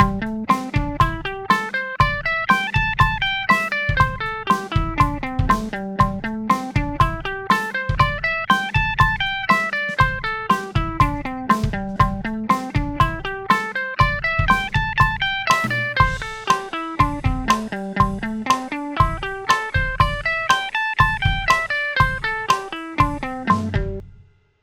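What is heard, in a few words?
A minor #5 octave 120 bpm eighth, guitar; A Minor Pentatonics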